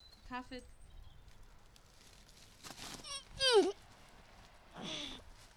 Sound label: Girl Lifting